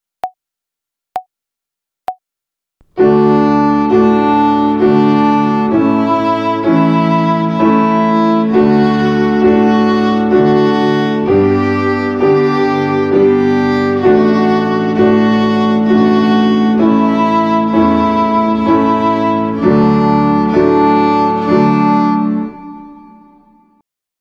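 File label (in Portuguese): Ginástica até o dedo 3 - Corda RÉ - Ternária, Violino; Violino para os Pequeninos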